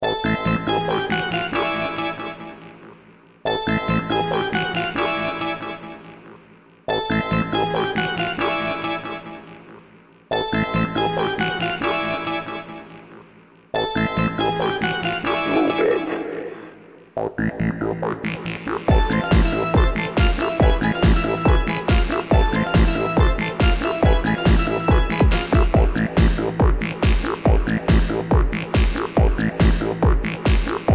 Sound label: Polka; EJay